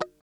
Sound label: CHUCK, FUNK CHOPS; Spectrasonics; Hans Zimmer Guitars VOL-1